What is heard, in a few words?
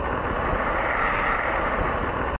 tux on ice; TuxRacer